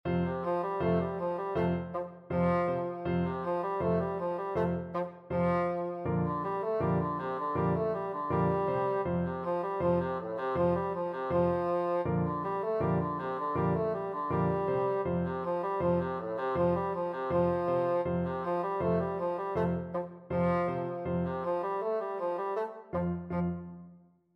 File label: tchaikovsky 1812, bassoon